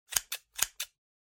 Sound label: Scissors Snip Snap